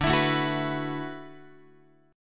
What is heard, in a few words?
D 6th Add 9th D, Guitar; D6add9 strummed; JGuitar